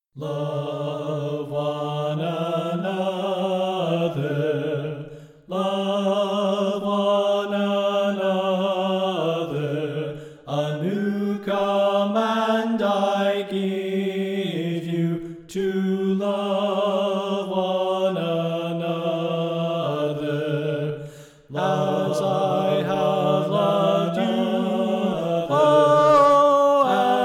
Soprano Left

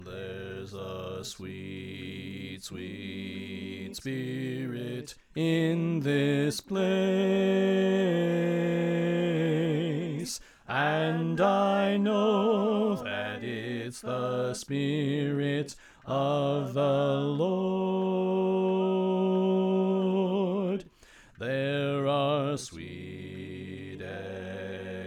Bass Left; Pennsylvania Groups; GQ Camp Reunion

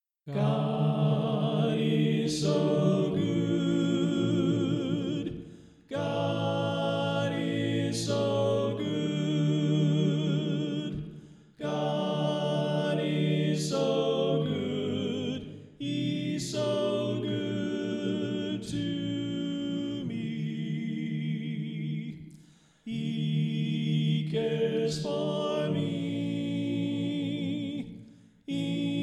Alto Left

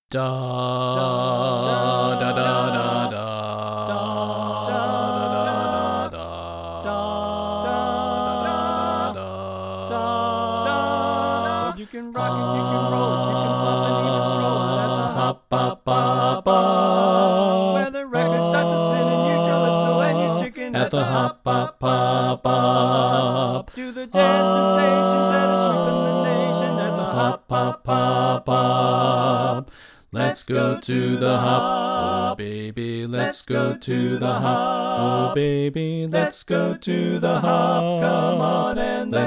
bass; Pennsylvania Groups; NBC

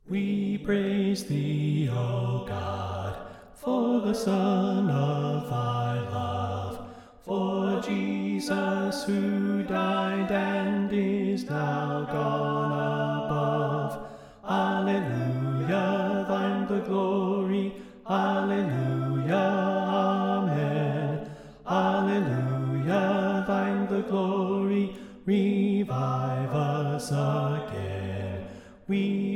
We Praise Thee O God Bass Left

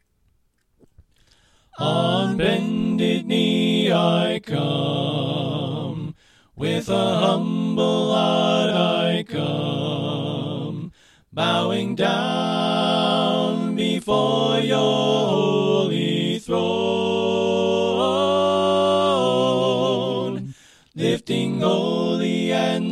Soprano Right

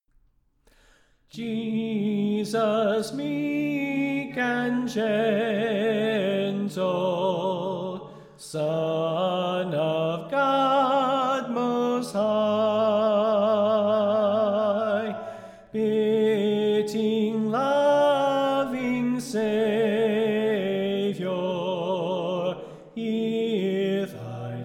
Soprano Left

